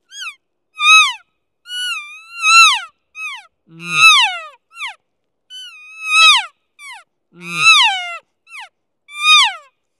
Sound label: distressed calf sequence, elk sounds